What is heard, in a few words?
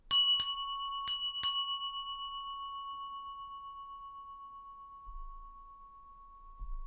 Chimes